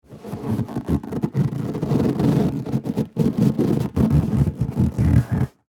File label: Exercise Ball, Sports, Handle, Large; Equipment; BlastWave